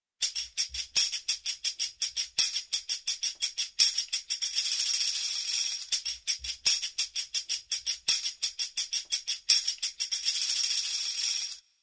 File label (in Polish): tamburyn; Nagrania Lokomotywy